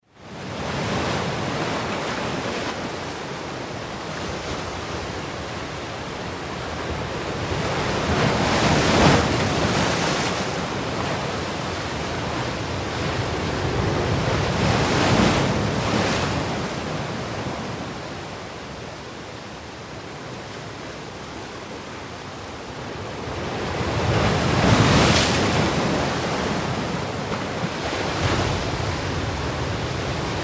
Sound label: Waves crash on rocks; sea